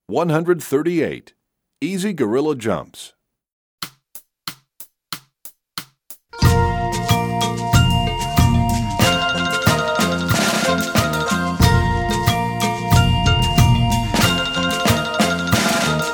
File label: Percussion; Easy Gorilla; EE2000 Book1